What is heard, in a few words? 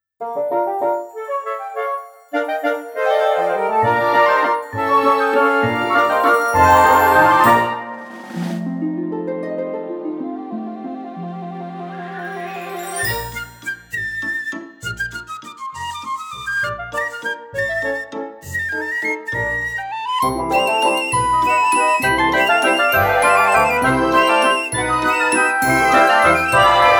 Birds